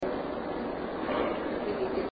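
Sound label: small growl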